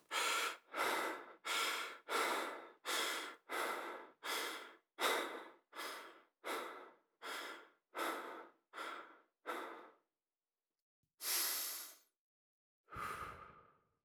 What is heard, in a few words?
breath; Ilyen egy gyógyíthatatlan beteg tüdejének hangja